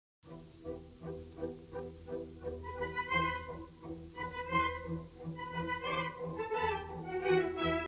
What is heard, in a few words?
Allegro con brio, Orchestra; Rossini; Toscanini; 1929; New York Philarmonic Orchestra